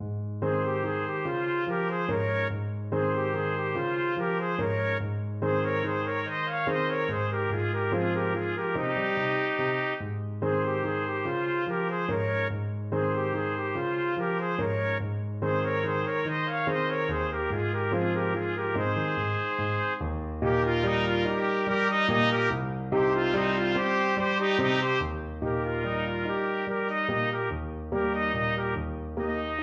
lakme trumpet duet

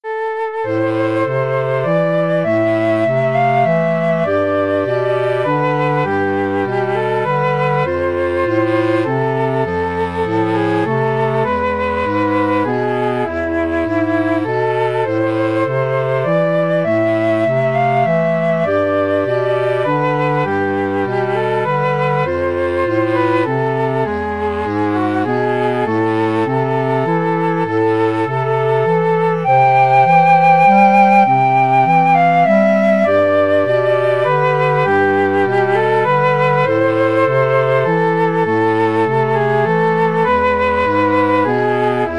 flute-clarinet-bass clarinet

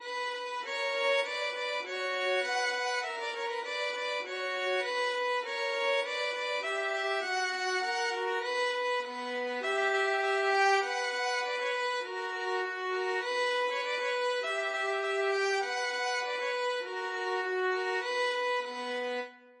Sivivon violin duet

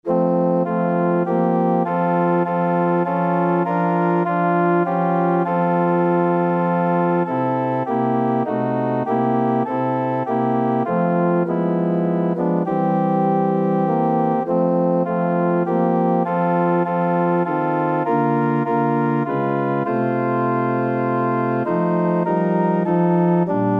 moment by moment, organ

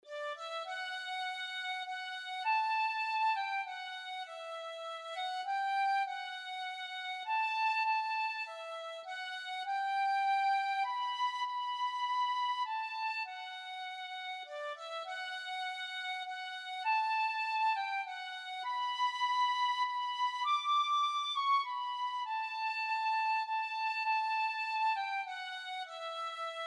just as i am, tin whistle

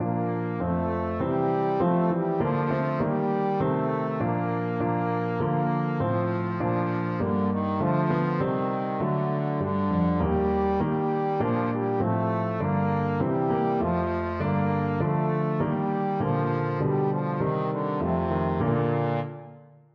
trombone

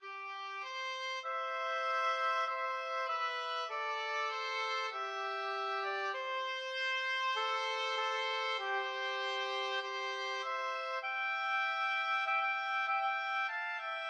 oboe duet

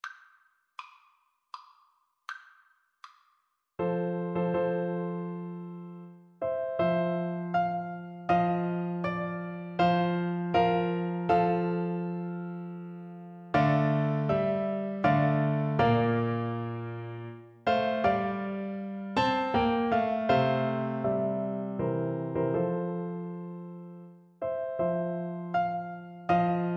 nocturne, Bassoon

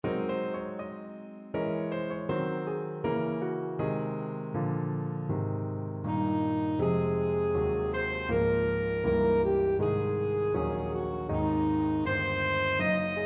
verdant braes, saxophone

